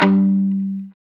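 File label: guitar chunk mute; 90's Sample CDs; Club-50 - Foundations Roland; Funk & Mute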